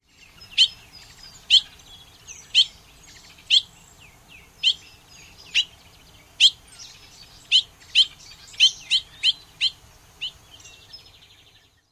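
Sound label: Bobolink pink calls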